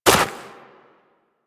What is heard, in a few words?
Walk Snow, footsteps; Warframe; Operator